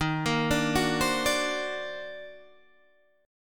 D sharp Minor Major 13th D sharp, down, Guitar, Open D